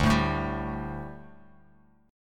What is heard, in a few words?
D Suspended 4th down Bass Standard; strummed